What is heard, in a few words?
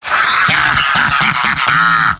laugh happy; Heavy; mvm